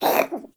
Kitten Cough Hairball; Warframe; Catbrow

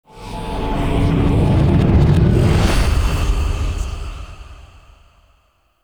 Cave Undercroft Intro Stinger; Warframe; Duviri

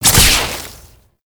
Alt Fire Shot; Warframe; Weapons; Tenno; Oraxia Flechette

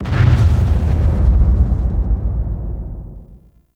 Distant Electric Explosion; Warframe; Corpus; Railjack

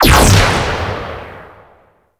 Shotgun Shot, Weapons; Warframe; Corpus

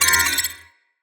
Command Wheel Trans Open, UI; Railjack

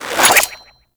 Grapple Windup; Warframe

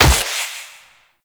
Reaper Wall Run Step; Warframe; Wraith

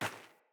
Sandals Run Rock, Footsteps

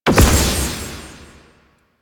Corruption Pickup Large; Warframe; Missions; Zariman

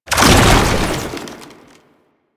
Run Start, Foley; Enemies; Warframe; Proto Infested; Babau

